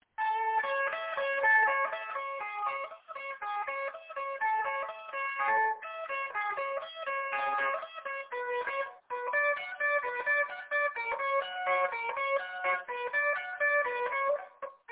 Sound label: guitar god classical solo